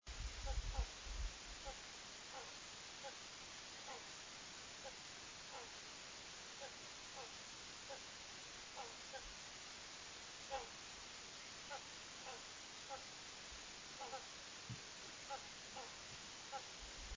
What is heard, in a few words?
Hornbil